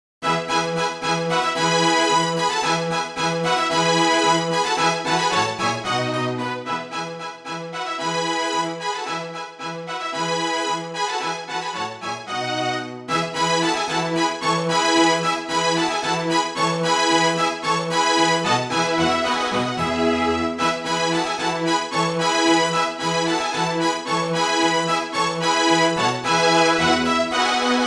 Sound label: Kawai CA501 string ensemble